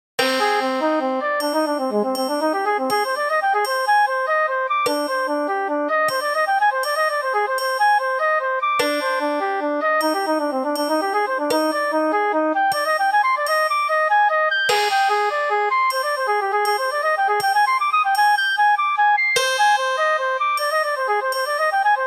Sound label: three beats